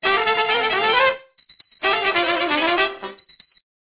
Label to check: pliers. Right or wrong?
Wrong